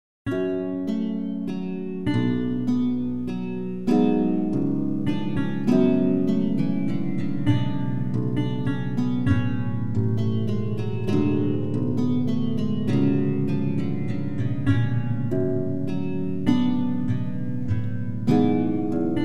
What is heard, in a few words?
menuet G, baroque lute